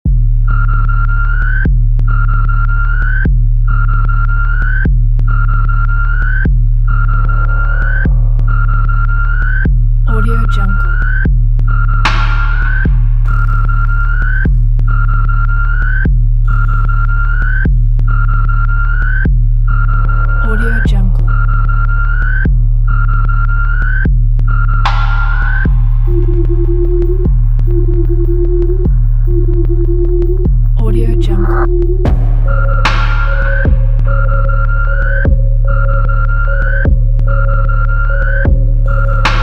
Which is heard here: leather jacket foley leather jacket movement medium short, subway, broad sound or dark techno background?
dark techno background